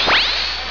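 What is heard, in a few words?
bullet explosion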